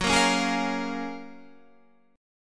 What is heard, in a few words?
Gb Major 9th, down, Guitar, Standard; GbM9; strummed; JGuitar